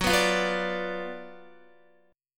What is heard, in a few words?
Gb Major 7th Suspended 4th Sharp 5th, down, Guitar; strummed; JGuitar